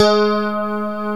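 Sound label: clean electric guitar; Death Strat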